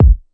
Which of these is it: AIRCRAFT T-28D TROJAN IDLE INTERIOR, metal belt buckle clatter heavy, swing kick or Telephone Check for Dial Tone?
swing kick